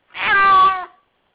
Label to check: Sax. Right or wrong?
Wrong